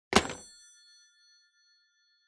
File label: Rotary Phone Hardware, Receiver Hang Up